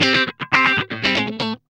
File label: GUI, LICKS; 120 LICKS